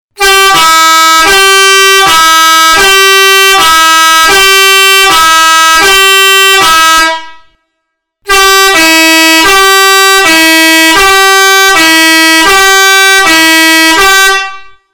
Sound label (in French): Klaxon 24V 2 trompes noir; TGV